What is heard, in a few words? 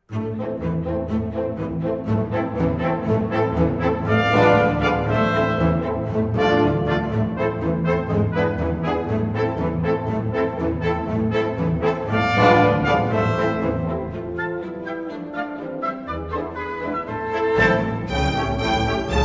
piano; level 2